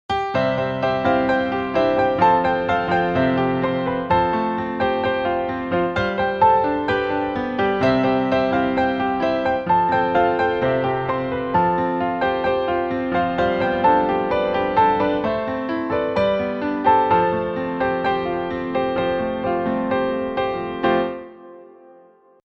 Piano

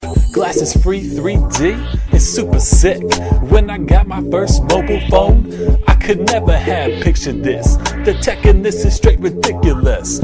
rap ringtone; hip hop

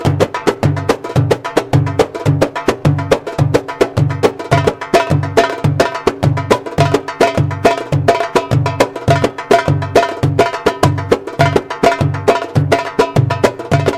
darbuka

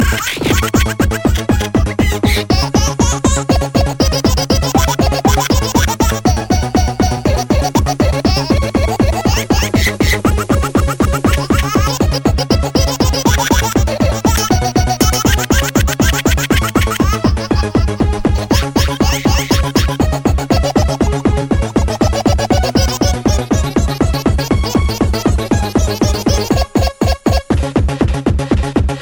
techno baby

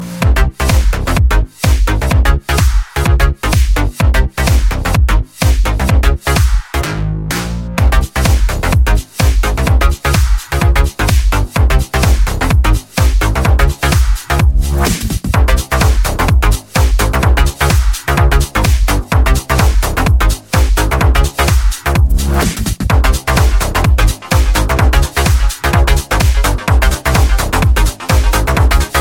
Upbeat Ringtone

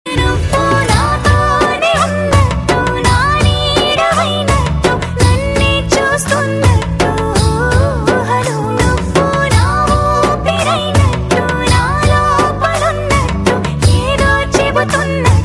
beat

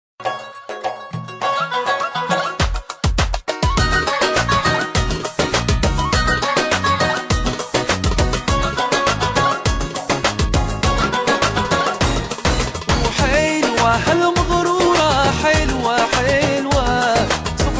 Jazz

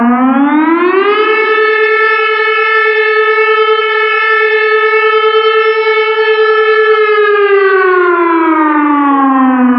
alarm in camp

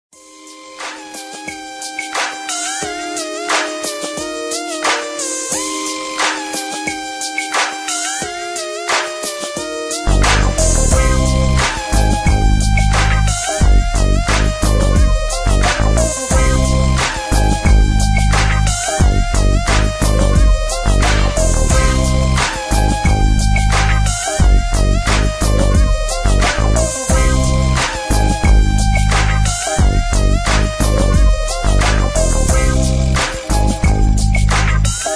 g funk instrumental

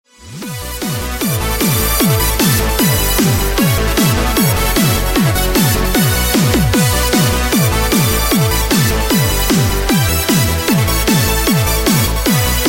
Uplifting

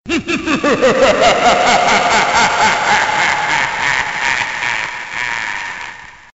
scary laugh; ringtones

